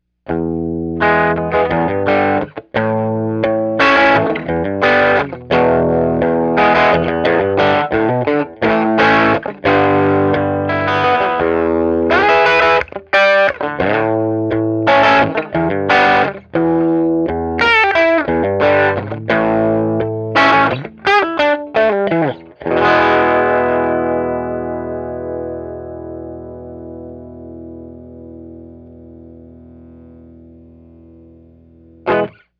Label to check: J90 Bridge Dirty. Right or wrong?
Right